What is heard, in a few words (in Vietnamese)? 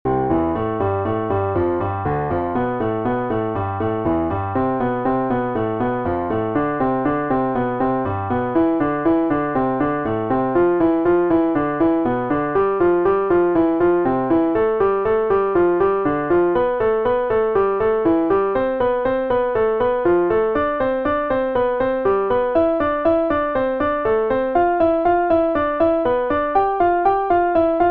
bai tap luyen ngon piano